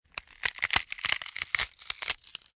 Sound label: Bones Cracking, Horror - Bone break. Bones cracking, snapping; Injuries; Combat